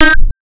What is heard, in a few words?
Star Instruments Synare PS-1